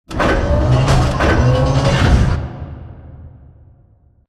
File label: Robot Arm Plate On To Conveyor; Boomtown; Titanfall 2